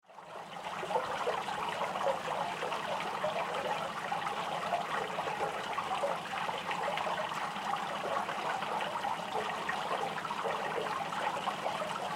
Water Fountain Run Small Public Statue

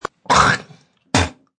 Spit Lugey, Hawking a lugey; Spitting Lugey 1; Human

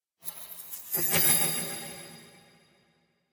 Magic Illusion; Magic Sound Effects

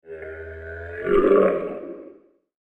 Throaty Monster Roar; Monster Sound Effects; fantasy; Andrew Fleming

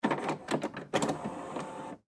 Printer Copy Machine Mechanical Start Up; Mechanical Movement; Office; Blastwave

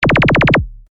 Laser Machine Gun, Fire, Shot, Science, Fiction; Scifi

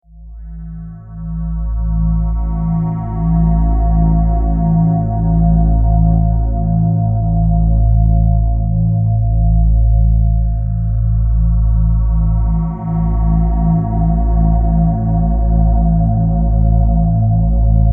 Ominous Drone, Slow ominous drone transition.; Drones (Sound Effects:Sound Design)

